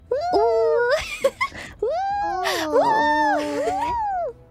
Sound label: uuuuuu; FaunaRyS